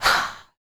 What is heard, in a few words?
FEM PERC, Vocal Jazz FX